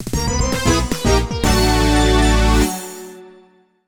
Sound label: Monkey Race Lose; super monkey ball adventure